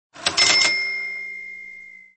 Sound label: cash; cave